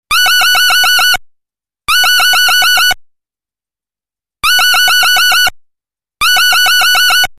nachtigall, Vögel; midi; Polyfonisk musik; siemens

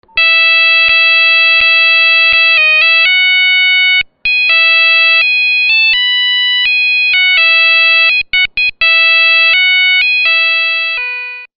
aegypten hymne siemens; midi; Polyfonisk musik; Hymnen

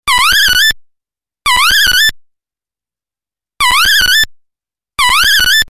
misteldrossel, Vögel; midi; Polyfonisk musik; siemens

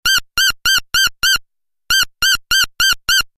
nonnenmeise, Vögel; midi; Polyfonisk musik; siemens

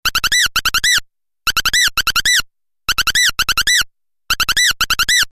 distelfink, Vögel; midi; Polyfonisk musik; siemens

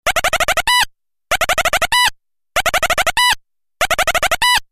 goldhammer, Vögel; midi; Polyfonisk musik; siemens

